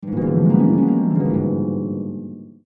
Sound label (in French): Harpe